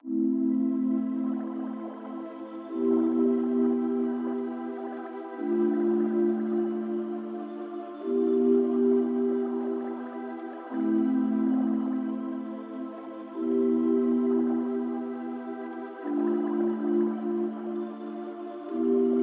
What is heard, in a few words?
90 BPM A Min, Melody; Cymatics; 2022 Melody Collection Platinum Expansion